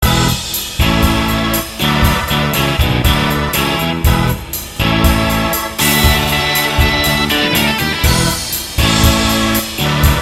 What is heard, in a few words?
Rock Vamp